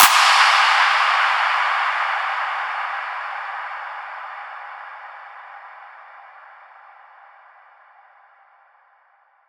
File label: impact fresh clap; Virtual Riot; Impacts